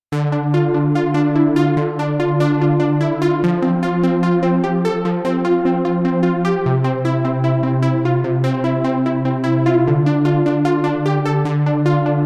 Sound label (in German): Sound mit Phaser und Spring Reverb; T-6 Plug